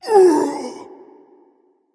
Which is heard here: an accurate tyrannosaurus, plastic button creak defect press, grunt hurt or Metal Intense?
grunt hurt